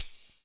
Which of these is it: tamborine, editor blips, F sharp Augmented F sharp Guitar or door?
tamborine